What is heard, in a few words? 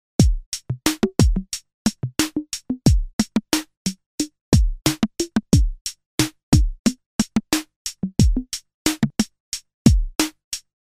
SH-2 Drums